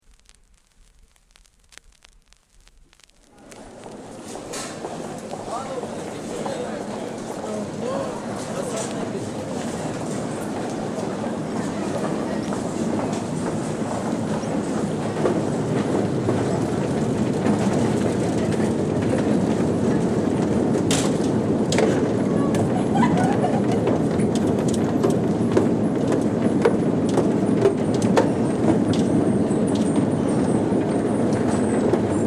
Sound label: Descent to trains at Victoria sta